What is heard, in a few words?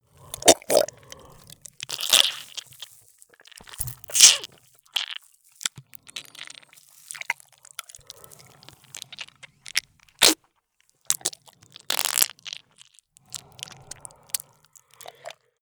monster flesh eating slow; koerpergeraeusche; comedy-cartoon; soundpool